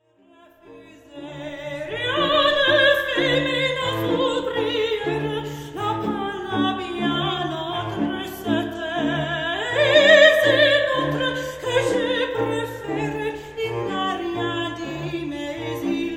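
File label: String Quartet With Soprano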